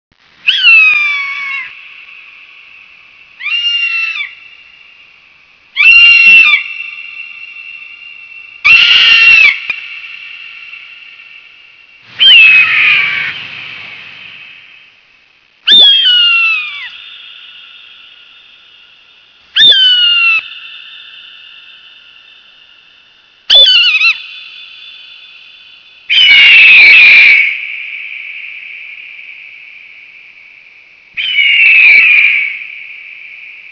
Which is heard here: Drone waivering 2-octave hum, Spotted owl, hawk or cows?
hawk